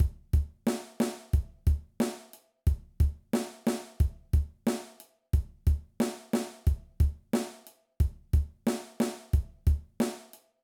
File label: Basic Drum Beat